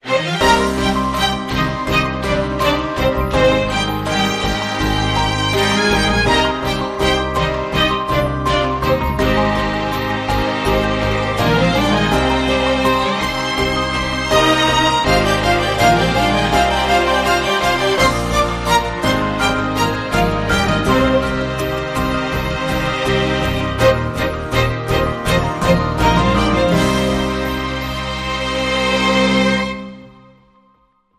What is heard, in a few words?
Big String Beat No Drums